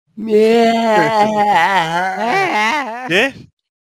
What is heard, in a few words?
Eeeaaaa Goofy